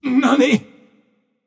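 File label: nonverbal surprised; smite; voicepack; merlin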